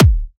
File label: Kick, dubstep; Ghosthack - AC2024 - Day 13 - Dubstep Sounds 2025; Frost Kick